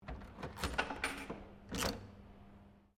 SLOT MACHINE BB TARGET PISTOL COIN DROP, Various old coin slot machines